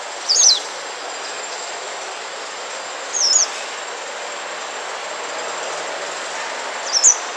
hakusekirei; zukan

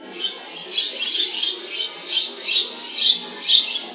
kosiakatubame; zukan

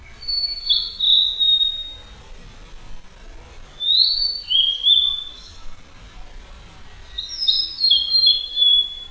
ooruri; zukan

